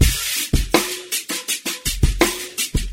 escaped air, Boombap drum loops

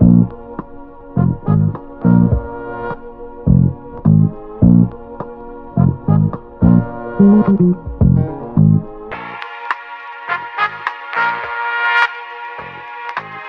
bass funky, Phonky loops

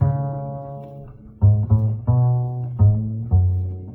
bass, Loops

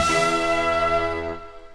String

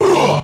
"ooh" group of men; ooh ahh

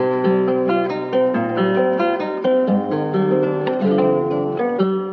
guitar funky mexican, Phonky Loops

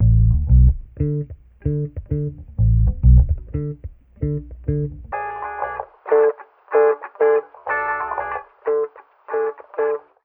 guitar, Loops, Phonky

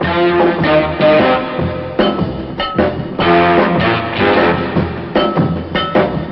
horns, Phonky loops

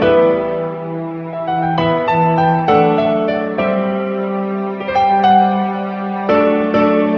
piano, Sad Loops

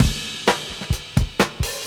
Drum loops Funky

